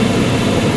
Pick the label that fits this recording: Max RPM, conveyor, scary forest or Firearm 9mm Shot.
conveyor